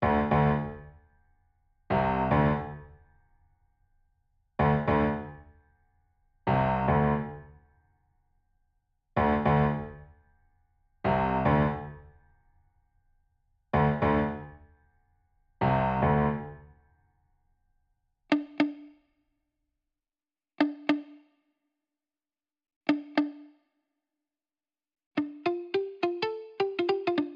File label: Fixing To Go, Piano and Guitar Only